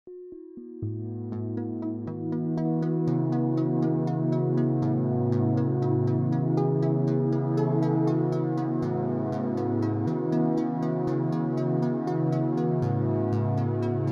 Deckardsdream SRE330 Quad Mode

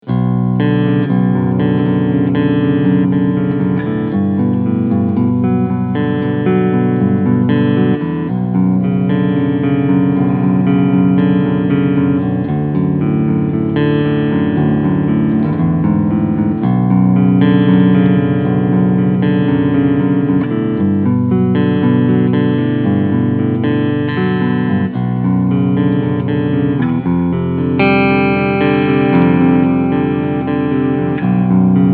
PRS SE Cleansound Front Humbucker; PRS SE Mark Holcomb

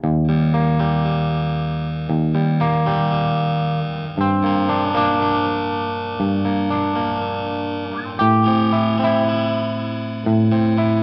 Timeline Lo Fi; Strymon

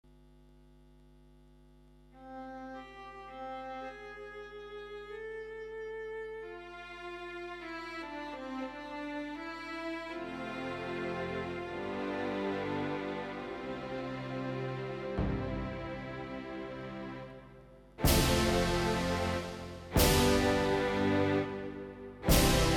Pa3X LE Strings Orchester

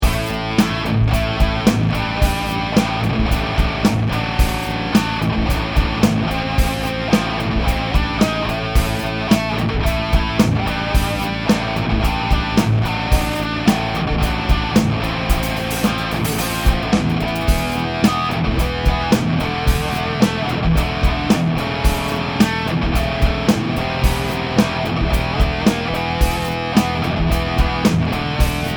Crunch Boost

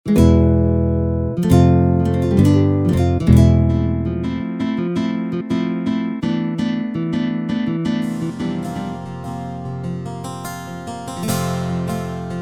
Guitar

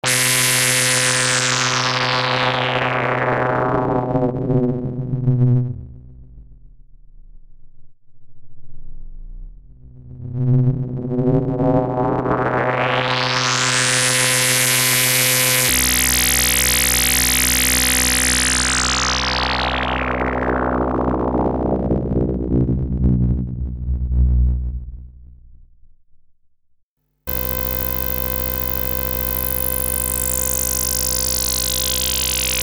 minimoog filter lfo

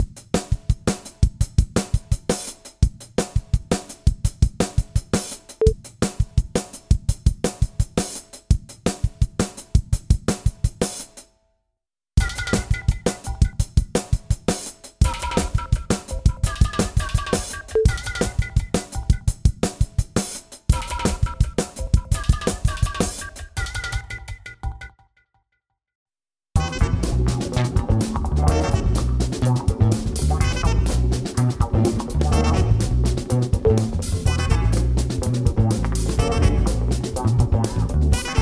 mids; DRAWMER 1973

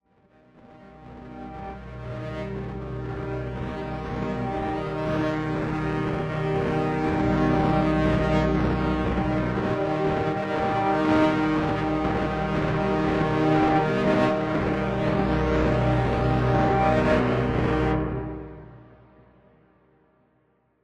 Novum Emitter Fast; Dawesome

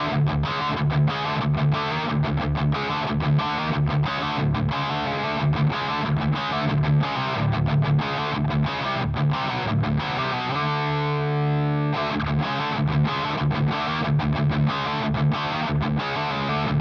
HX Stomp Distortion Rat; Vergleichstest

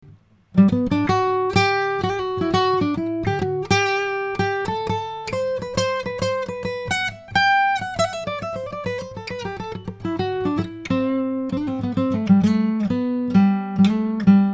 Melody mic

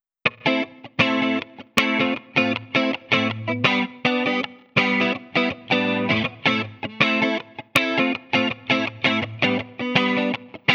Valeton GP 200LT 80s Disco

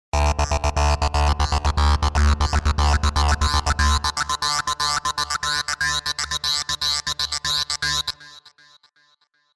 Avenger TG Bad Mosquito

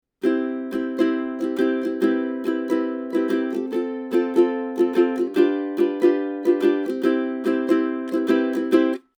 Schoeps CMC1 MK4 Ukulele 40cm

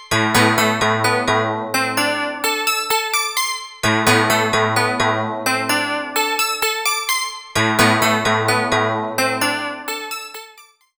Behringer Space FX Korg Volca FM2 Spring

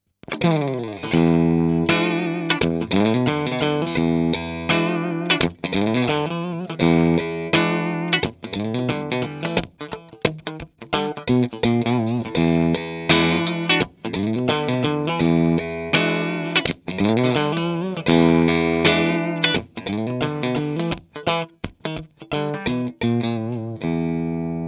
Clean Bridge PU Single Coil

